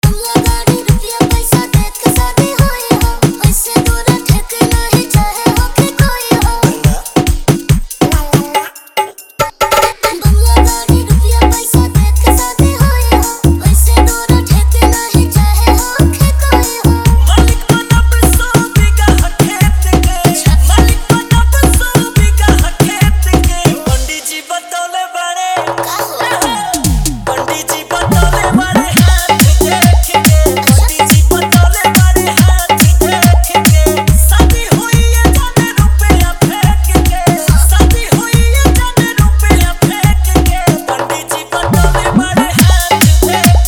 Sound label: Bhojpuri Viral Hard Dhollki Bass Mix